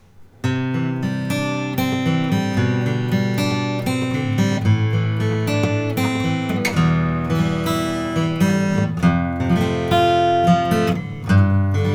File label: 000 14 white lady natural; john greven